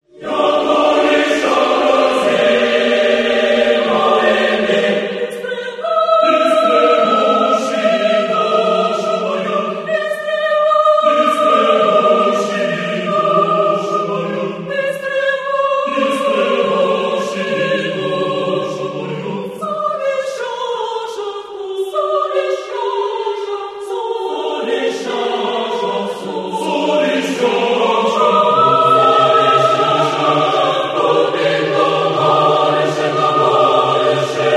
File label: choir; NRCU